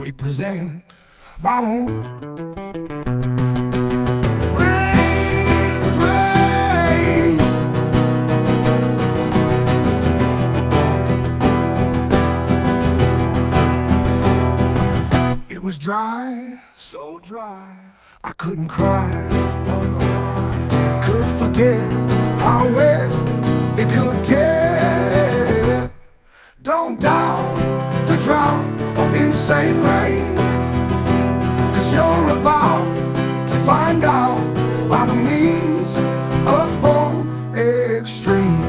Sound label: Unplugged; MegaEpix